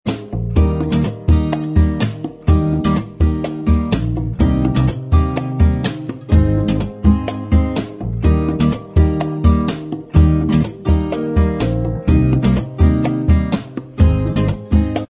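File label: acoustic style instrumental track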